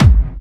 BD Clubby; VEC1

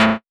Bass A, Offbeat Bass; VEC1; Club

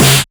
Snare; Takebeat; Club; VEC1

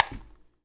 Clap

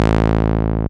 Bass Long A; VEC1; Club; Long Basses

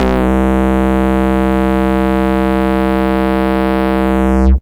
Bass Long C; VEC1; Club; Long Basses

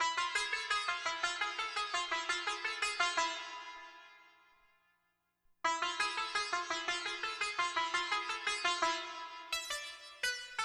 Koto, Hip-Hop

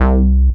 Bass C, Offbeat Bass; VEC1; Club

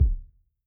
Kick

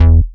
Bass E, Offbeat Bass; Club; VEC1

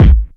Kick, Hip-Hop

